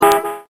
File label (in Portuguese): BUZINA